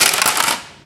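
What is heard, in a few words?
physics clipboard impact small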